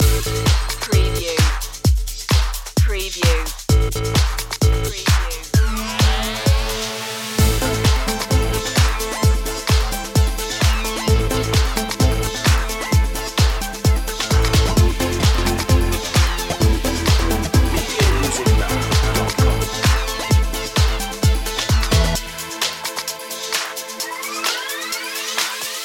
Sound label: dj spooky flash loop